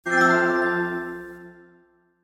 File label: ps4 notification